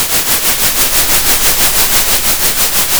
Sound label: center, Sound of a train